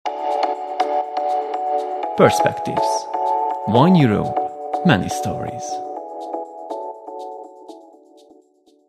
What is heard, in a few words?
perspectives jingle